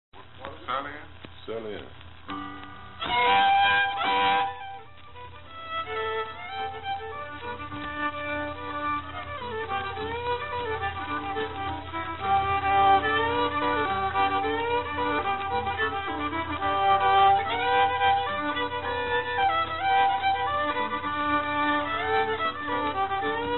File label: guitar G